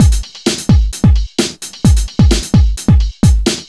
130 drum loops; HardwareStreet; M8; Electronisounds

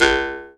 C# JAW, Primitive Instruments

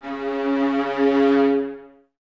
String Ensembles, Violas; C#; Miroslav Vitous; LINKS ORCH

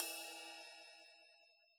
ride cymbal, Yamaha DJX Rock Kit; 144 Rock Kit

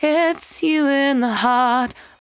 74-5bpm B, Velvet Vocal; While U Could; Zero-G

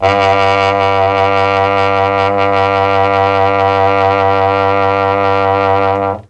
G1 TROMBONE, Mellotron; CHMB TRMBONE; Mike Pinder - Mellotron Archive CD

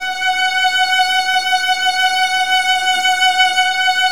Virtuoso Strings; ILIO; Kirk Hunter

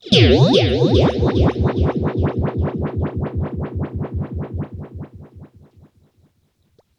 RIPPLES R, Funk Guitar; 90 s Sample CDs; Zero G

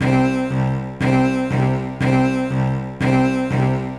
120 C string, String Loops, Trance; Trance Explosion Vol1

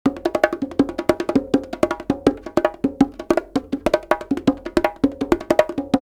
Live Perc Riffs; Jungle Frenzy 1

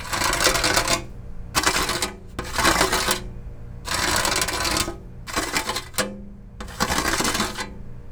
Cheese Grater, kitchen

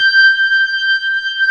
B3 G, organ; AKAI S-Series CD-ROM Sound Library VOL-8

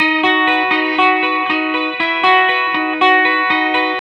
BYRDY RIF 1 B, Vintage Blues Guitar, 120; USB Soundscan vol.22; AKAI

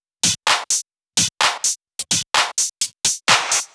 Loops 128BPM, Drumloops

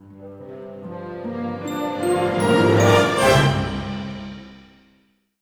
FLY; East West; Scoring Tools; ORC; Flying Star

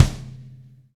V-Pop Kick; Roland BK-5

